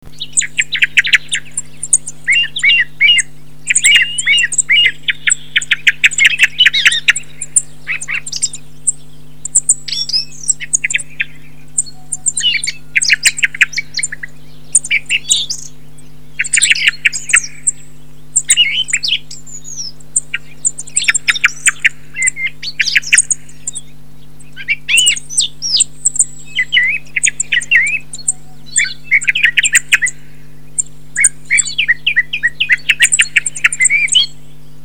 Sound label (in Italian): TORDO; CANTI SAG NATURE 2024